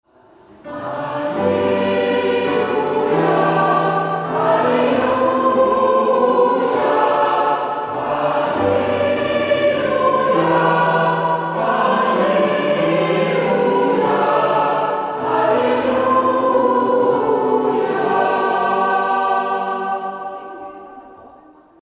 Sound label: Haleluja ruf